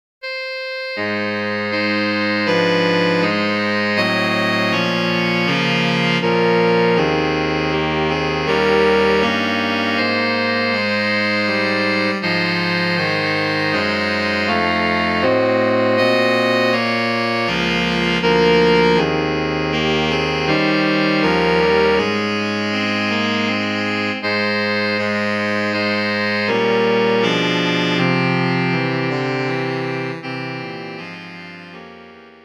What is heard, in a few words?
Carol Sax